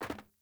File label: Sandals Land Metal, Footsteps; Characters; Player